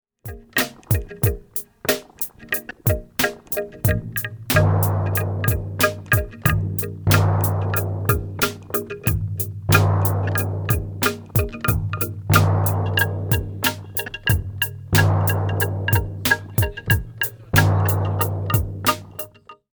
percu timbale tuyau; residence MDQ

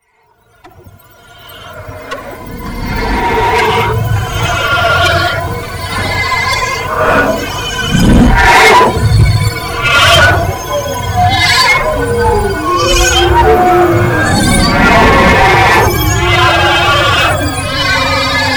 eau flute; residence MDQ

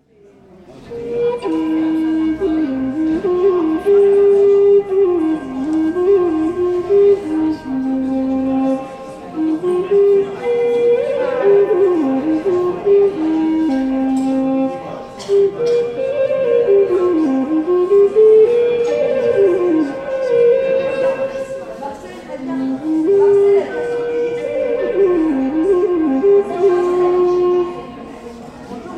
essai flutes

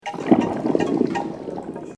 eau souffle; residence MDQ

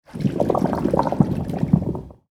versement bassine; residence MDQ

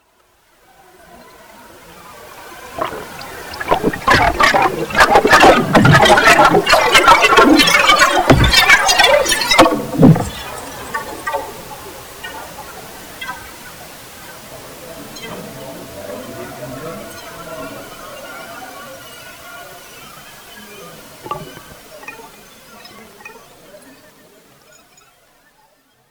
eau trouble converse; residence MDQ

